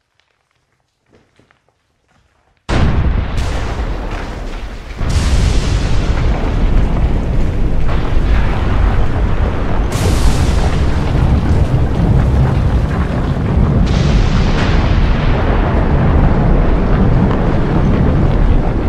Explosive Volcano Sound Effect

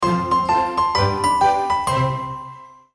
winter holiday logo

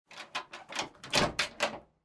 Abrir puerta Door open Sound Effect

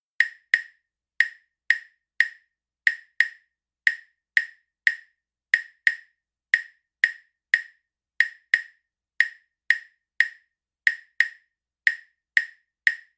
clave son 2 3

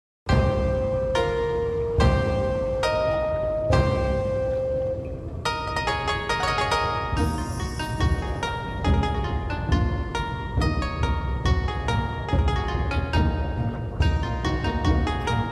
Dojo Baked In Ambience Mono; Club Penguin Low Quality OST

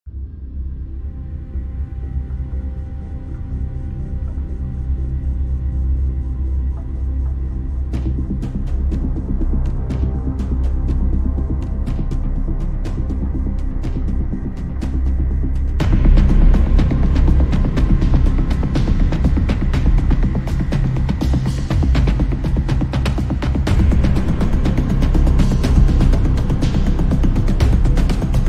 Horror Movie Suspense Background Music

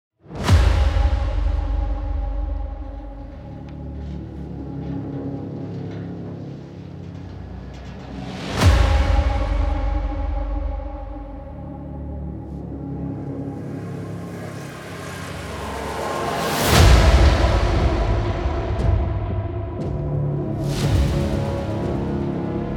dark action horror trailer

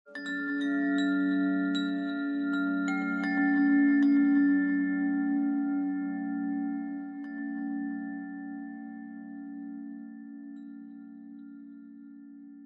ambient chimes

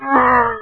baby triceratops

